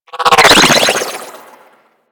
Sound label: Flak Drone Projectile By; Corpus